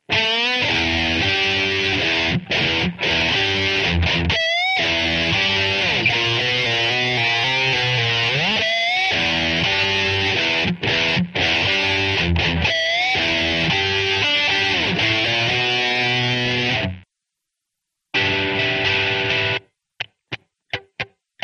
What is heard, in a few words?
Rock guitar; Total Rock gt